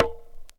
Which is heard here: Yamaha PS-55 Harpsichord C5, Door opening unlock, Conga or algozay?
Conga